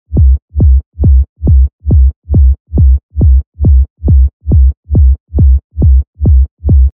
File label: Reverse Bass Loop B (138 BPM)